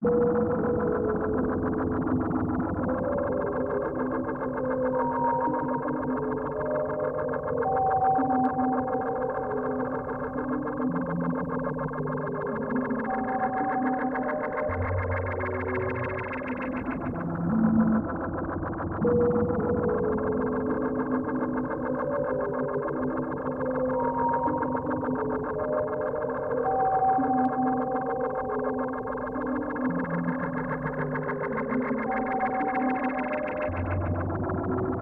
Spaceship Ambience; Chuchel

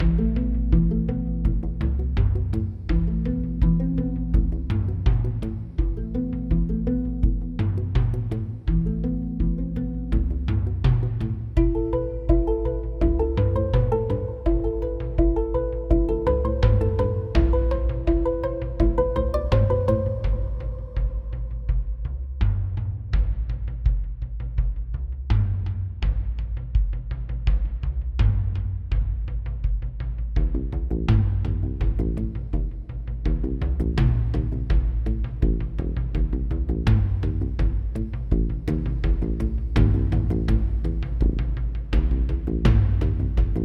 jungle

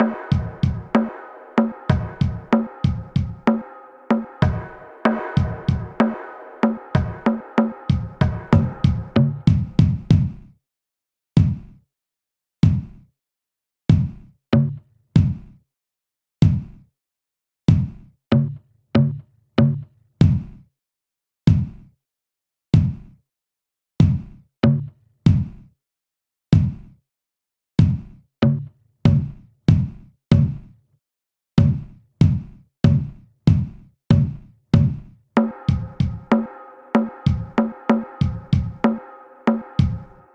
Electrical Drums; gamerip; The Undergarden; xbla xbox 360

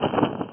Moth; Buggy; PS1

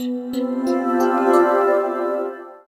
Round; Zenses Ocean; nds gamerip

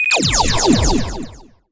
Call Beams

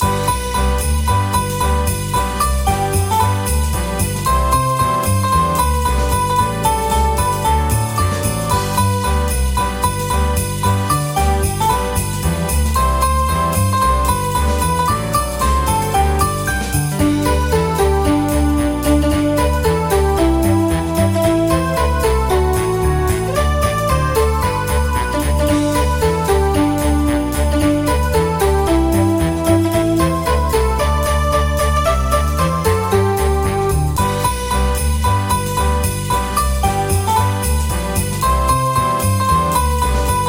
Jingle Bells; club penguin

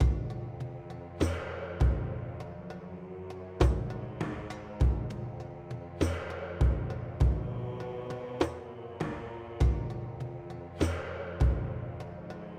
menu music; primal light linux macos switch windows xbox one xbox series xs gamerip 2020

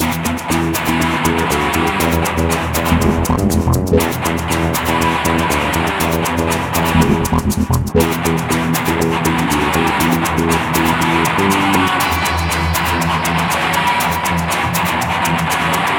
Guitar Slow; yager windows xbox gamerip 2003

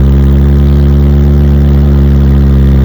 Car Med Loop; Nicktoons Nitro; arcade

